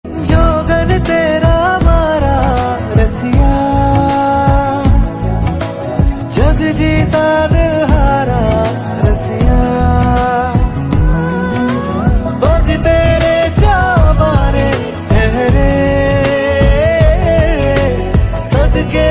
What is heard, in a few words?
Hindi Ringtone